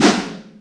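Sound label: Tom Ambience, Boss DR660; drum machine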